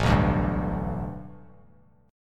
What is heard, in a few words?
A 7th Suspended 2nd Suspended 4th Bass Standard; A7sus2sus4 strummed